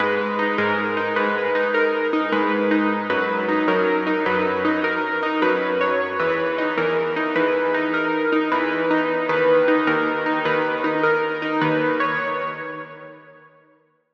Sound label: Key - Exile; Routed Sound; Vital Expansion - Street Vanquisher Vol.1